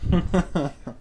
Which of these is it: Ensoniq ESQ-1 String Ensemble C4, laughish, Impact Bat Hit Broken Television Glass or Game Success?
laughish